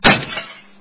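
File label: stone hit the window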